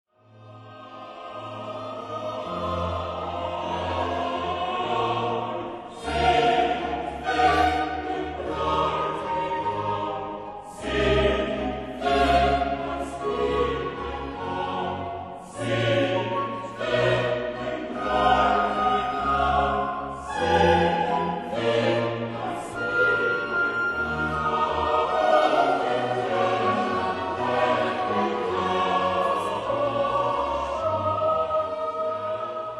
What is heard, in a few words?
dialoog; bach; kommt ihr tochter